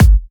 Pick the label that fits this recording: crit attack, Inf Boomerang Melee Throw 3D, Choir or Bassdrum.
Bassdrum